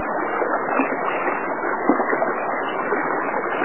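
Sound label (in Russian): водопад близкий бурлящий нежный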